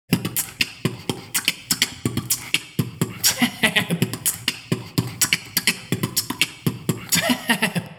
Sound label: beatbox; jungle; 16beats